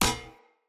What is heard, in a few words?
Grab, Swords; Weapons; Player; Mockery Weapon Common